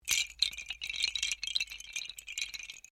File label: Windchimes Bamboo Small Short; Various windchimes; Musical